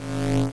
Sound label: whoosh